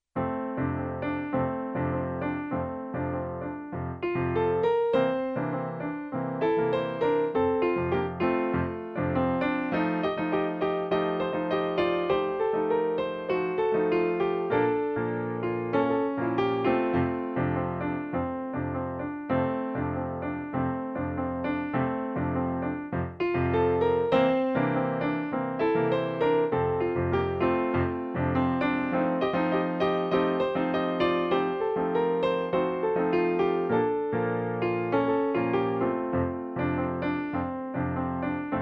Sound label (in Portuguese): Baião PIANO